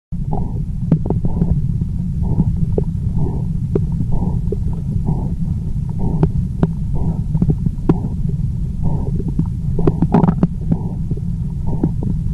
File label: Renal artery bruit